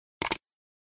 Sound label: mouse click; simple messages